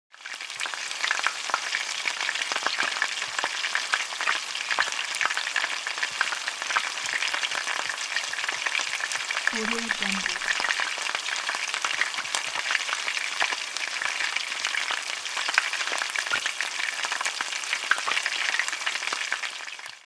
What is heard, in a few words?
frying food